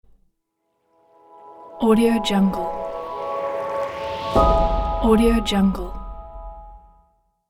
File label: peaceful logo